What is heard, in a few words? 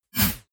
whoosh metallic synth short